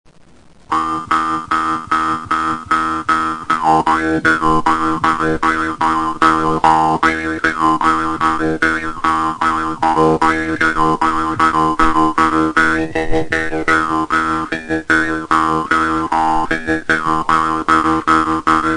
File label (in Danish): mundharpe